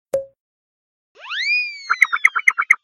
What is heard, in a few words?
farm throw wrong